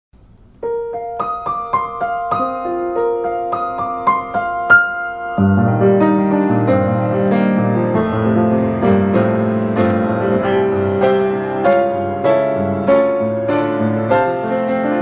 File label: piano, ringtones; Disney